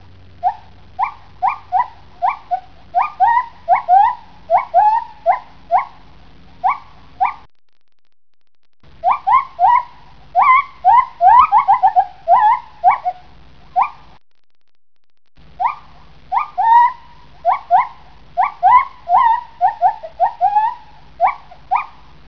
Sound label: Male, gibbons